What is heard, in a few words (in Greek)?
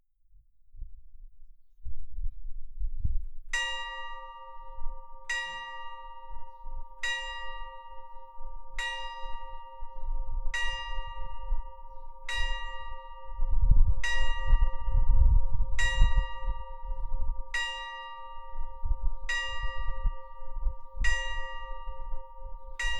ΛΟΓΟΘΕΤΙΑΝΙΚΑ ΚΑΜΠΑΝΕΣ